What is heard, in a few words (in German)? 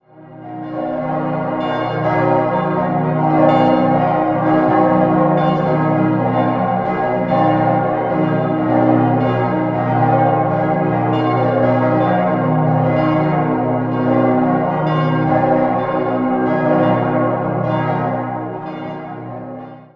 Kastl, Glocken